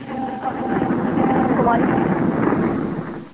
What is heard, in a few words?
causeway drop